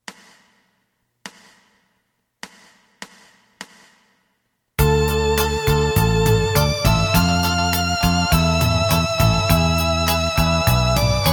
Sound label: lead